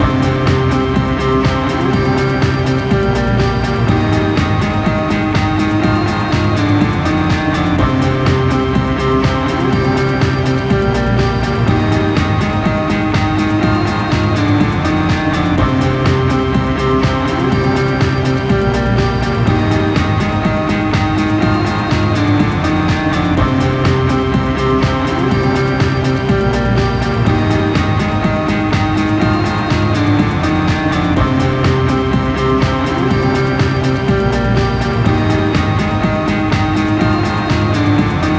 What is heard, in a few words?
Ditty; MLB Home Run Derby; Android, iOS